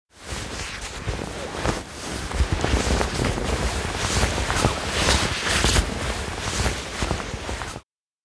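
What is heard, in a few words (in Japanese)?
kigae fuku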